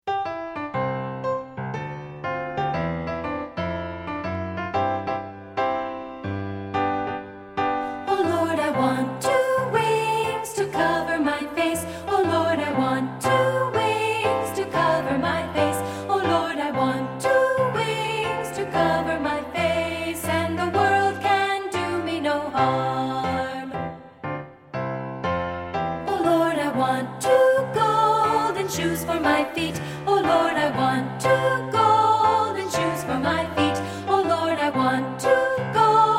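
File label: SSA soprano; treble; I Want Two Wings; SOP2; partbypart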